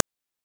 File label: PITCHED NOISE; NES DPCM; MIDINES